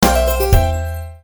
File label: transition; Royal Unicorn